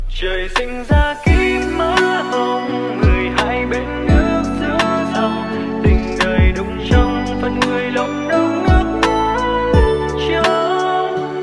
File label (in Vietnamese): Nhac Chuong Lofi Ver